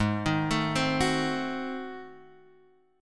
Ab 7th Flat 9th down Guitar Open G

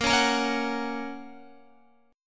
Bb Minor Sharp 5th Bb down Guitar; strummed; JGuitar

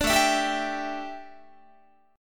A 7th Suspended 4th, A, down, Guitar

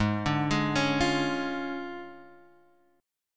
D Minor Major 7th Flat 5th, Ab

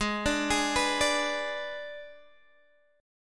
G sharp Diminished

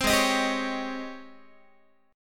G sharp Major 11th, Guitar; G#M11 Chord

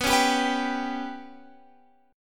B Suspended 2nd Sharp 5th

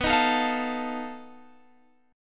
B 7th Flat 5th B Guitar Open G

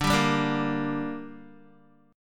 D Suspended 4th Sharp 5th, Guitar; strummed

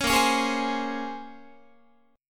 Bb Minor 9th Guitar, chord; Bbm9; strummed; JGuitar

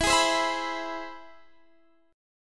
C Augmented Major 7th E

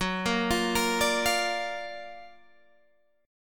Gb Augmented Major 7th Gb down Guitar Open G

